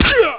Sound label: punch